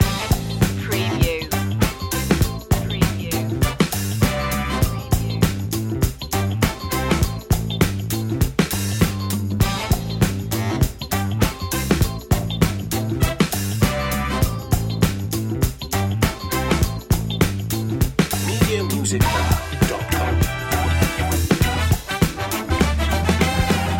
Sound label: tower of funk loop